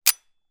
gun 1911; weapon; still north media

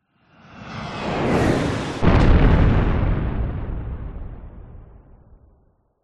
airplane jet crash explode designed; vehicles

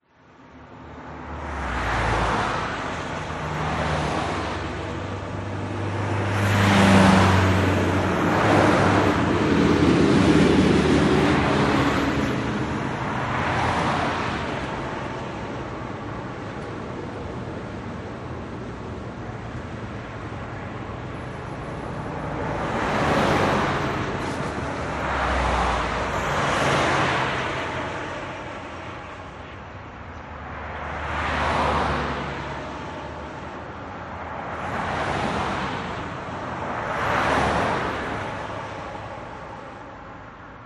vehicles traffic fast pass road midday birds; zapsplat

